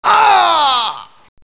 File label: die; payned